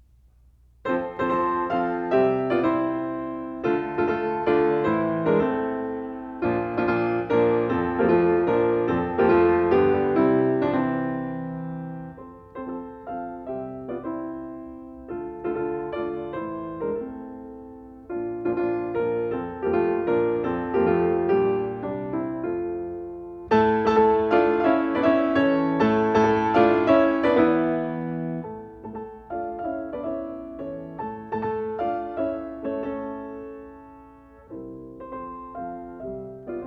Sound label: Mazurkas for piano, No. 3 in F; Chopin